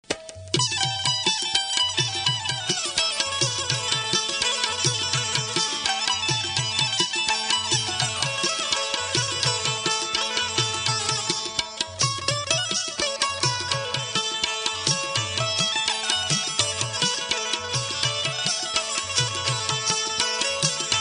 Balochi Ringtune

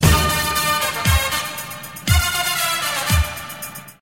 Sms Alarm

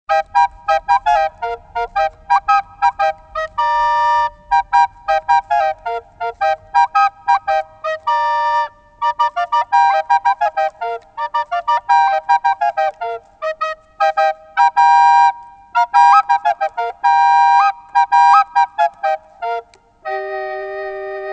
Flageolet

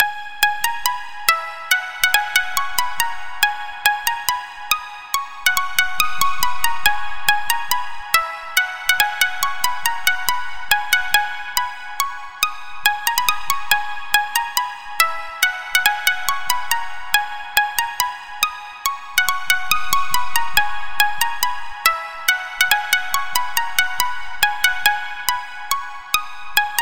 Asian String

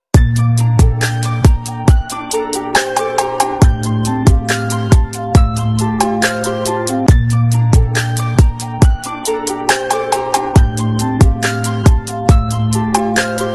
Hip Hop

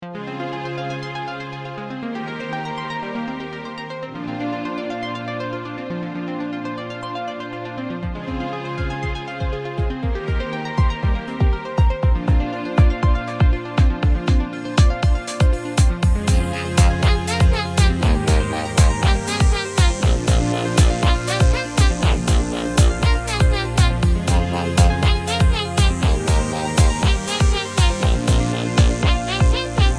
Chillstep

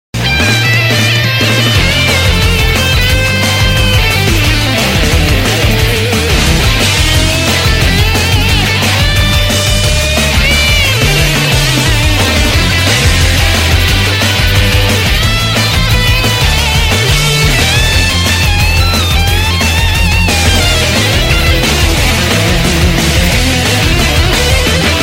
Guitar Solo

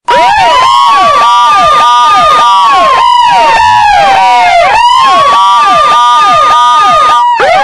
Police Ambulance Mix

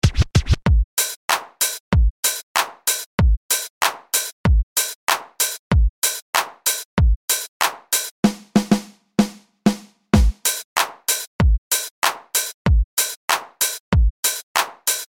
Hip Hop

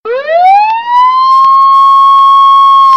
police

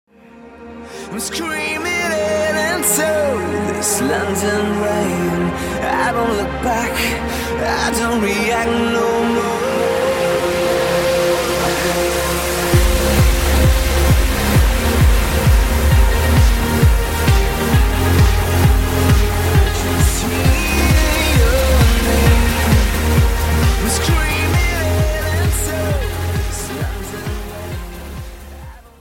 Rain; London

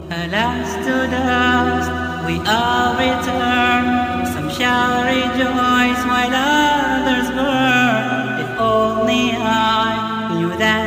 Last Breath

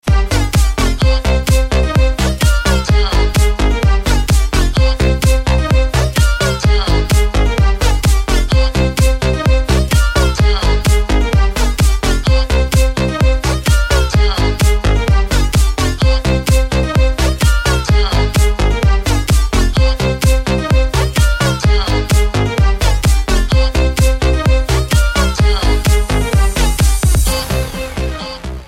Great Tune Mix

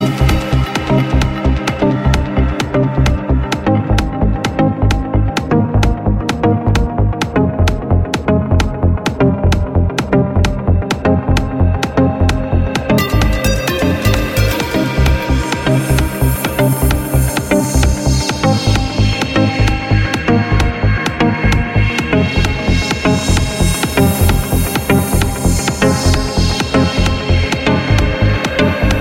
Trance

